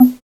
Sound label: Perc